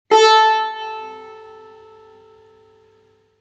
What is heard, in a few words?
tar la